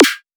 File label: snare split recombine